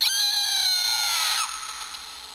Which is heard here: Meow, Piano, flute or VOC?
VOC